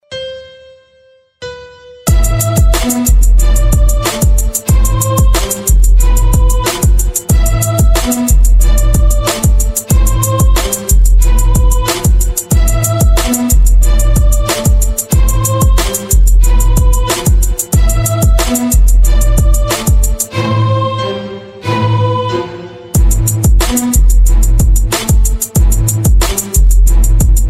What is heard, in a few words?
Hip Hop Beat